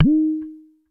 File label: MID DRIP; Spectrasonics; Liquid Grooves; WAVDRM HITS1